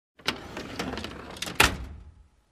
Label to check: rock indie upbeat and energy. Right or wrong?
Wrong